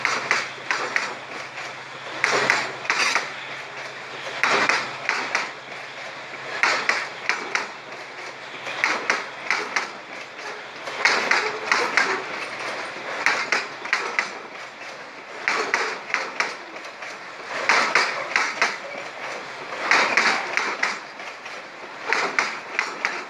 Train pass joints

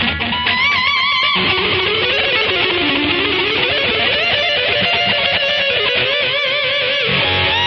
Electric Guitar Solo

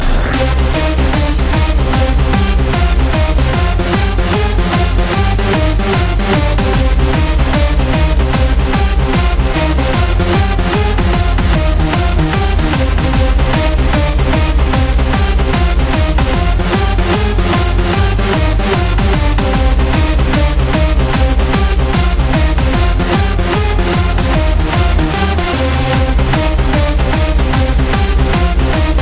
Bass Energy

